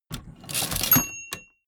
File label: typewriter